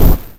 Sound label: gun